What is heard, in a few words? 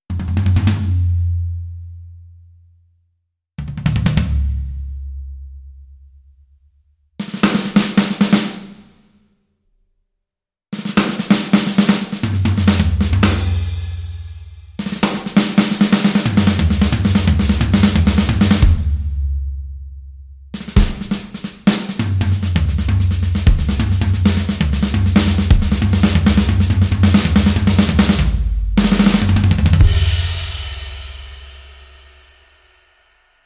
Nervous Beats